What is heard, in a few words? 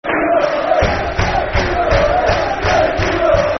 Green And White Army, Football Chant; Yeovil Town Ytfc; fanchants